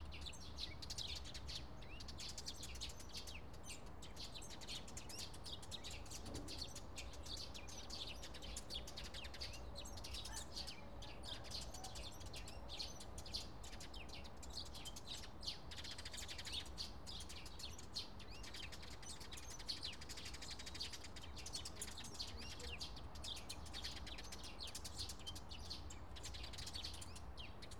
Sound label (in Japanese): スズメ